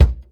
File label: dry kickdrum sample a sharp key; kick drum samples